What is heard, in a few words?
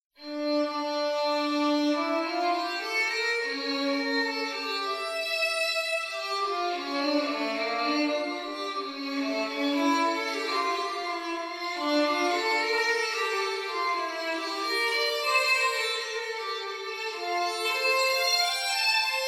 Bach violin